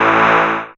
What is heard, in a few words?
syn a, Dance Synths Stabs & Hooks; vol.37 - Dance Synths